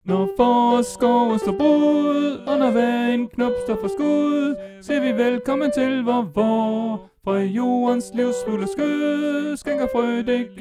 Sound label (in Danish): Sopran